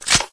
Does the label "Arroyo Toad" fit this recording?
No